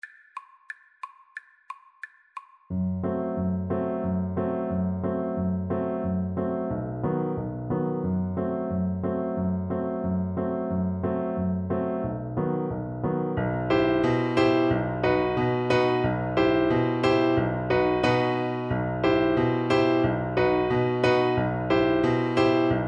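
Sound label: viola karaoke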